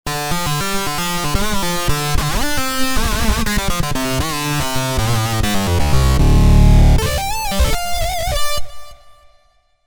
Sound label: Lead Mean Lead